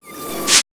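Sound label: reverse, Big Beat Drumloops, speed 140; USB Soundscan vol.25; AKAI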